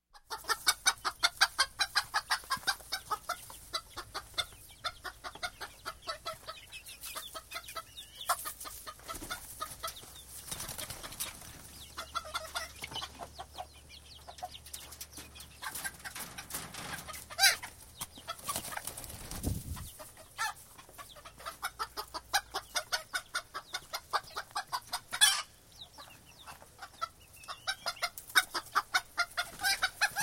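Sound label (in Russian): кудахтанье курицы птичий двор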